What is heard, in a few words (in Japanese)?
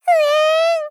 ふえぇぇん; 【ゲームオーバー2】